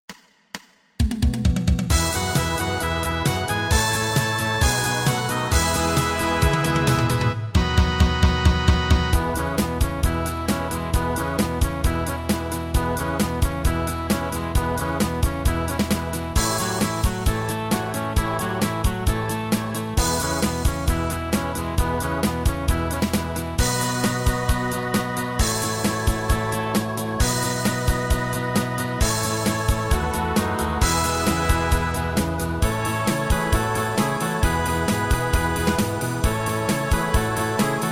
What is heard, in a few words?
theme song karaoke